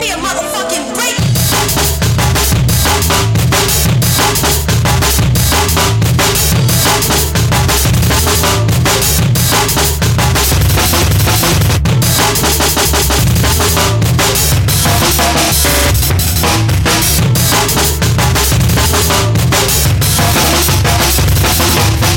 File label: Breakbeat